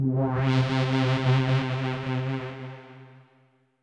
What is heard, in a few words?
stench; 【効果音】モワッと悪臭のイメージ音; 生ゴミや腐ったものやトイレなどムワッと悪臭の臭いイメージ音の効果音素材です。